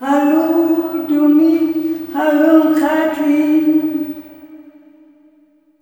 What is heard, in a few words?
LULLABYE, SOLO FEM; Spectrasonics; Heart of Africa 2